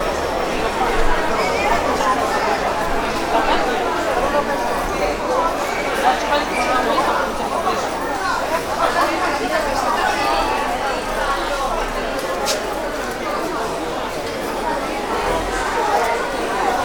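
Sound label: atmosphere coffee; best service Blue Box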